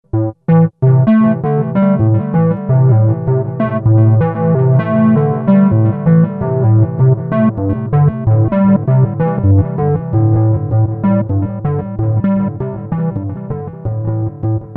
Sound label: synth delay; Creamware minimax